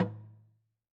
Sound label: Log Drum Lo, Slit Drum; Idiophones; Struck Idiophones